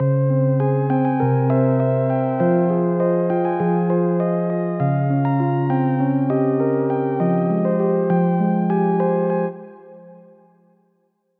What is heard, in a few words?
Hybrid Advanced Lo Fi; midi wizard 2